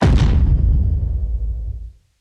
stomper stomp, enemies; Factorio

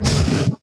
stomper death big, enemies; Factorio

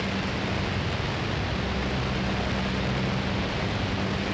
thruster engine; platform thruster; Factorio

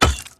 axe mining iceberg; Factorio

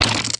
mined iceberg, mining; Factorio

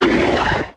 stomper warcry big, enemies; Factorio

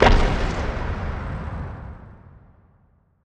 asteroid damage interstellar huge; Factorio

